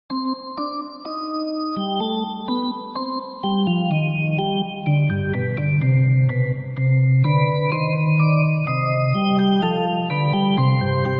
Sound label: Organ short in C minor; UMI